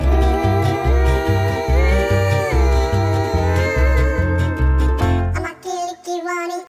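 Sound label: ocean world music suspense beach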